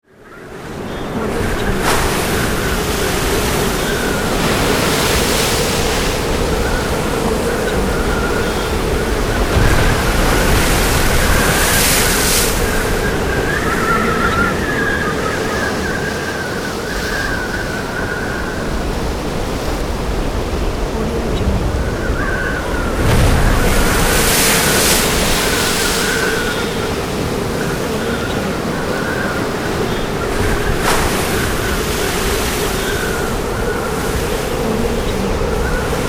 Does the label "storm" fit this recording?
Yes